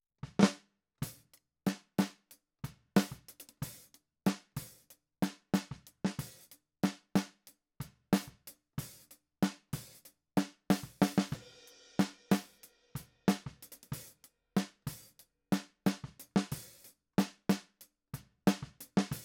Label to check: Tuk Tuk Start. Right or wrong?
Wrong